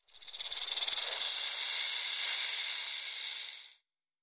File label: Rattle Snake Short; Animals; XBOX; BassProShopsTrophyHunter2007